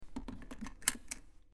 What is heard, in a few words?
buckle; norden-sounds